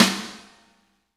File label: SNR, Roland; Real Snrs